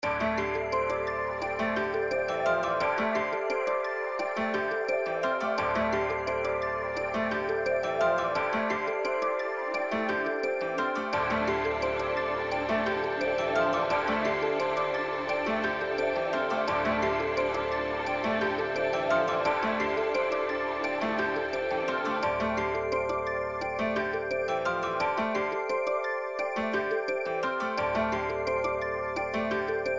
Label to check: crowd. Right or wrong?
Wrong